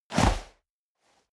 step boots sand jump

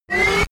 sci-fi laser shot charge short; soundpool

